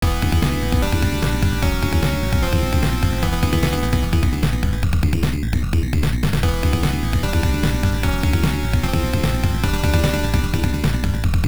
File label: Boss BGM; super star soldier j; turbografx 16; Machine Voice